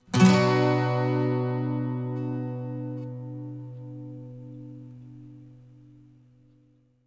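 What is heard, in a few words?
12 string minor B, Electric & Acoustic Guitar Loops; USB Soundscan vol.04; AKAI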